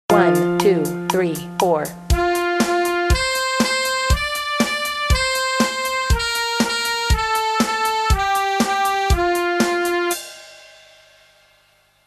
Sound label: Twinkle Female